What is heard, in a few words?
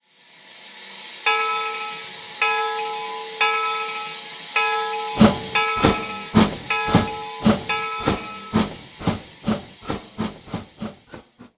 Bell Steam; RailPro